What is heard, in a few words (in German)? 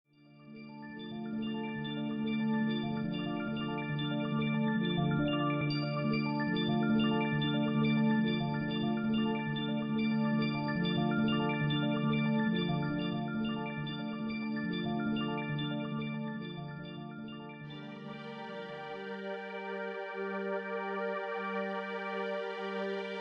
Gloeckchen Entspannung; mon relaxant clochettes